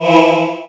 siphon; items

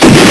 bump; eva-01

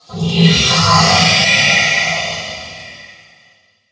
alien; zaero; world; tomb

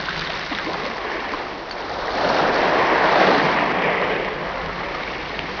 beach

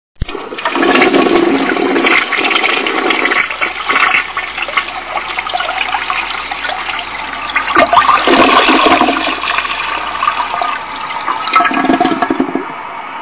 toilet; mom

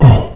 bump

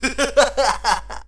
pick; baseq2

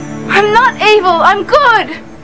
death

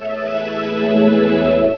teleporter spaceship

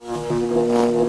charged bolt

